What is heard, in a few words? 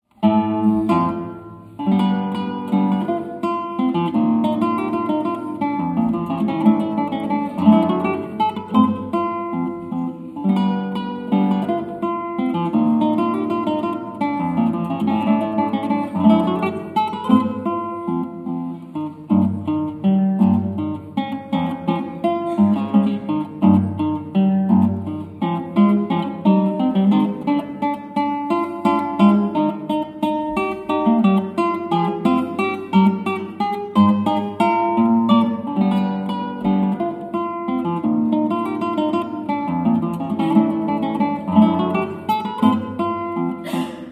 Mazurka LIVE; USA 2002; New York